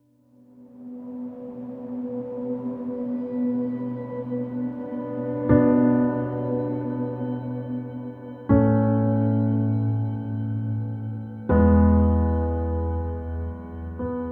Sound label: (Instrumental)